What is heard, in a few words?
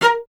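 strings cello A#; Miroslav Vitous; Solo Instruments VOL-1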